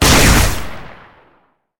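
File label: weapon plasma caster fire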